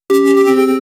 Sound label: calm kill point in game